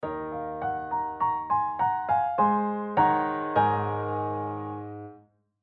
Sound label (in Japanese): ピアノ教材的な感じ短い; ジングル; 短いver